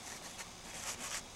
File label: scrapping rhythm